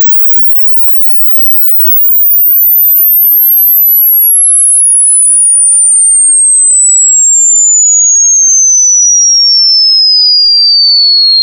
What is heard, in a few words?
sweep; ethermen